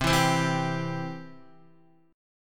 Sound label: C Major, Guitar; strummed; JGuitar